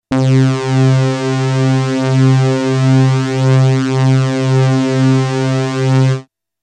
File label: Wide Multisaw; synth patches Korg Prologue